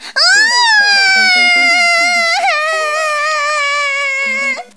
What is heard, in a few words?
cry